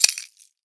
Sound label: jingle; ins2